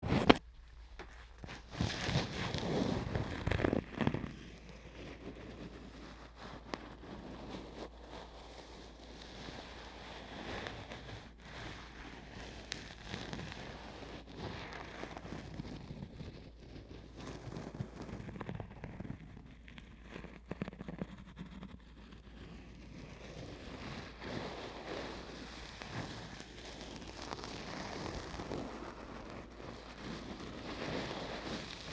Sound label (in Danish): Læderpung, contact sounds